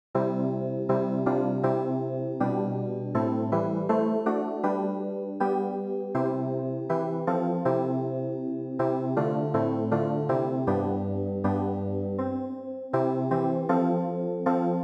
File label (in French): CLAVIER